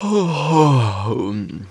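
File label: male emote yawn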